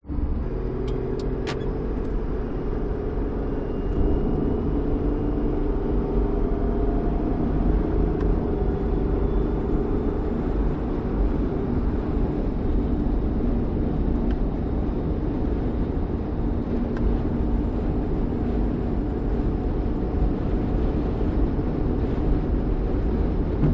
vehicles car hyundai trajet drive motorway 100kph occ indicating; 2006